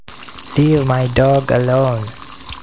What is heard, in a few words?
signal noise SNR=-10